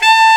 SAX A. MP A, Alto Short; Roland LCDP07 Super Sax

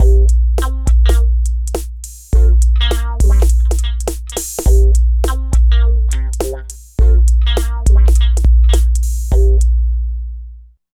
LOOP; East West - Phat & Phunky CD2; 90's Sample CDs

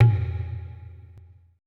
E LOG G, ETH DRUMS

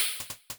Ride Cymbal, Genesis; The Lion King; M8

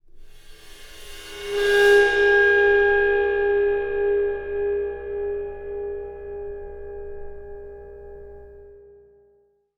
Void Perc; The Void; Processed Drumkits

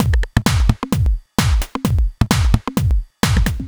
130 Hard Mean, Drum Loops 130bpm; Zero-G; Soundclash Nu Dance Electro; Acidized Wavs

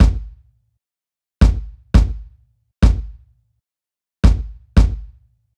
Kick, Kick Loops

